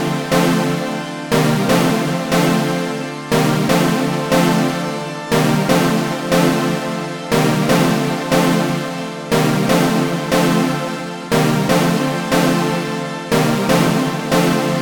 Panharmonium Feedback Reverb Like; Rossum Electro-Music

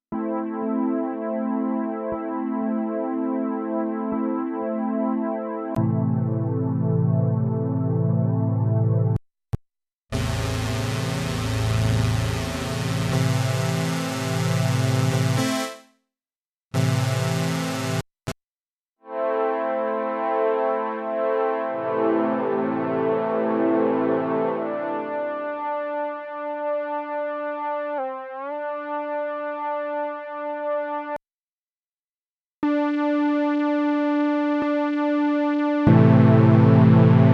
Pads; Sampletank

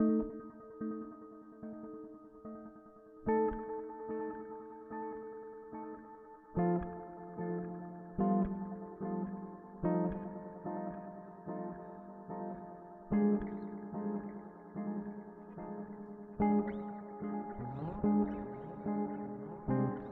Timeline Stereo Dual; Strymon

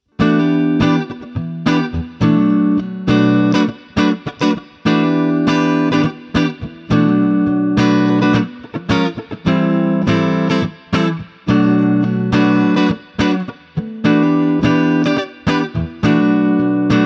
Squier Clean Neck PU

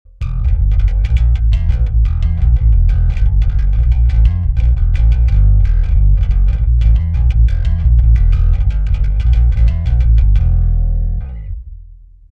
Deathmetal EQ Preshape Lo Comp Drive

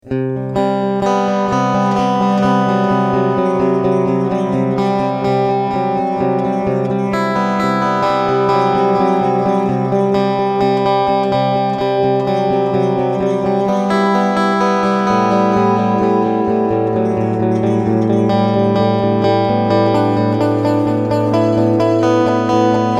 Digital Delay

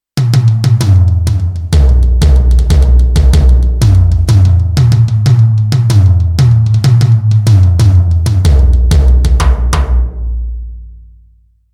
Dynamik Tom Tom

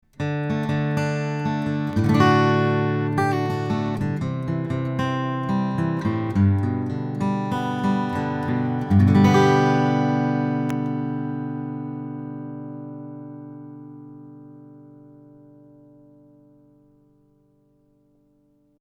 UR RT2 Picking Git Gain 3 oclock

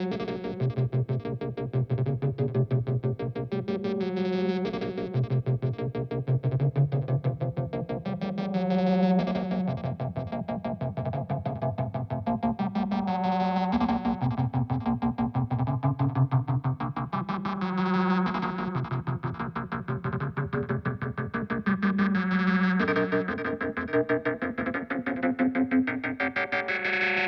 Dreadbox Lethargy

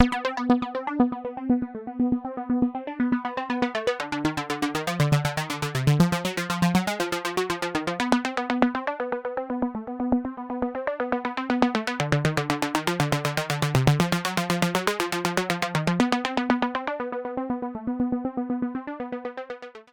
VCA Punch; WAVES Element 2

